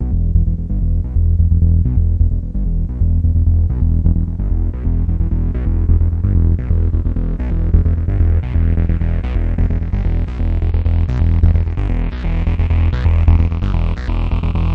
trance filtered synth loop; SYNTH AND ELECTRONIC

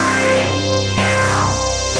Synthesizer Middle C Notes, Lead

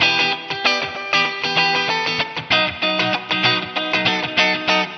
groovy guitar riff; GUITAR LOOPS

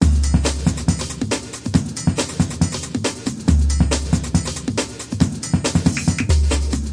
139 fun roomy breakbeat

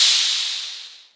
mrk hh, Maestro Rhythm King drum machine

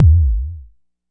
Jomox MBase, drum machine; Jomox MBase 01

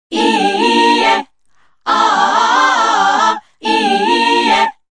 style, African vocals sung

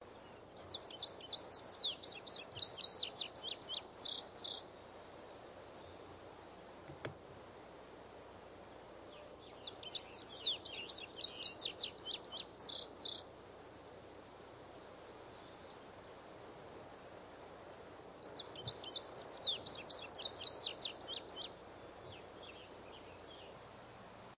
indigo bunting; Zoo and Nature

